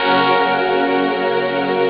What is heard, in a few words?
synth